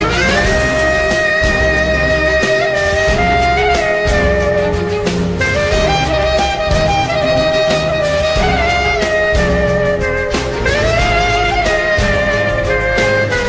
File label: beautiful music